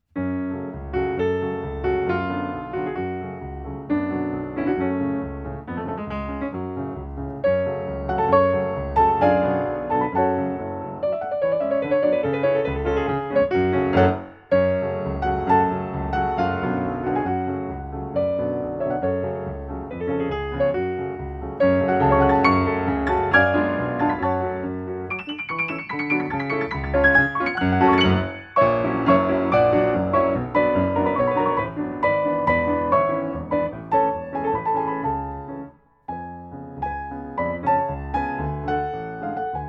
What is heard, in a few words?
hungarian dance fis moll, piano; j brahms